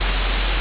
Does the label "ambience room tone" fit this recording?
Yes